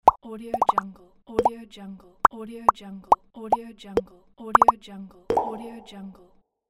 pops

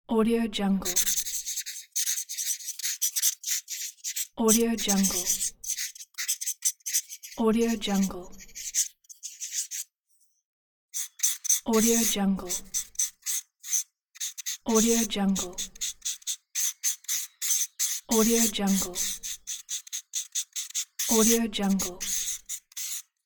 writing drawing with marker pen

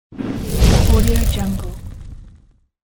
fire whoosh

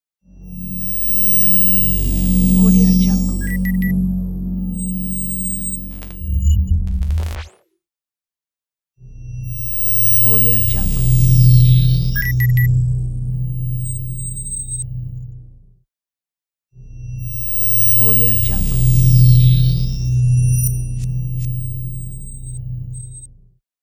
logo transition; dauzkobza

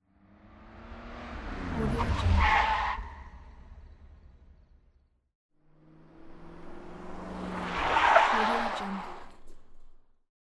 braking and sliding car

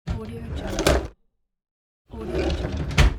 drawer open and close; reanimateaudio

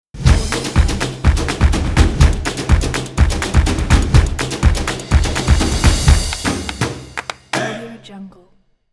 claps drums logo

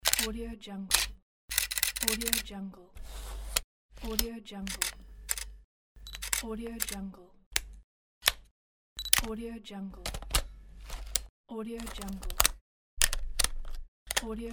digital camera

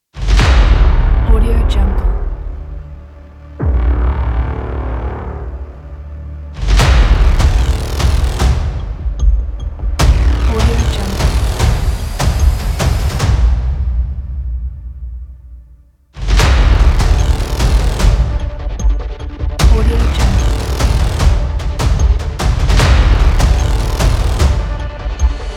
in action trailer

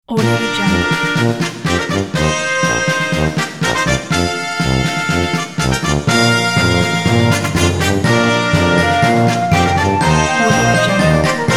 fun marching band logo

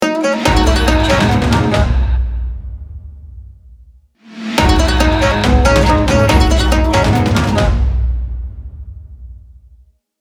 logo arabic; red-ninja

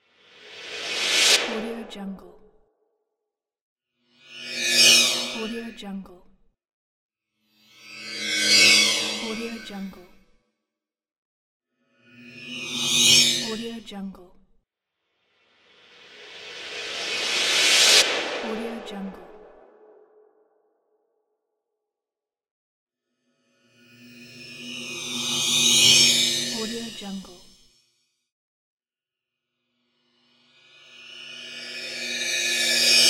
swoosh metal

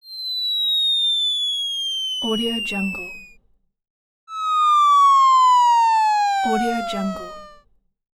cartoon falling

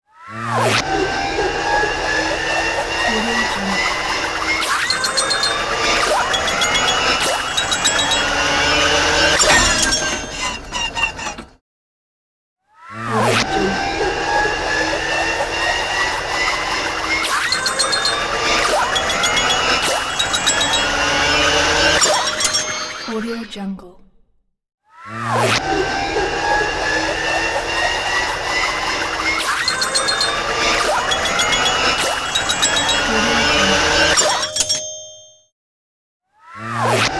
comic mayhem motor wind up

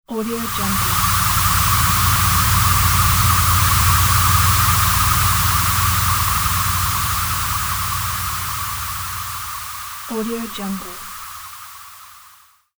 rumbling wind whoosh with flutter; sound ideas

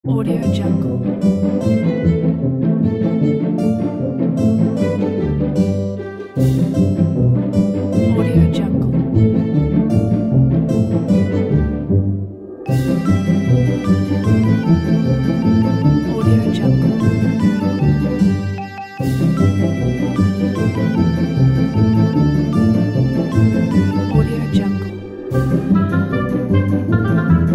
cheerful childrens game music

